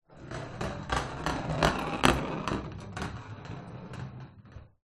household shaving gel can roll tiled floor pass left to right